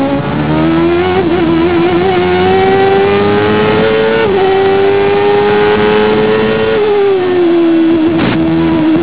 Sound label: indycar